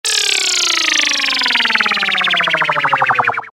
Power down, Power Down Of Scifi Electronic Device Or Machine; Control Panels; Stuart Duffield